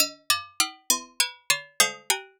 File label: Prism Percussion Loop